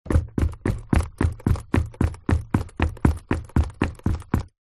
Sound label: foley footsteps shoes business leather buckle zipper womans running on wood floor